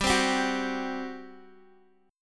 G Major 7th Suspended 4th, G, down, Guitar, DADGAD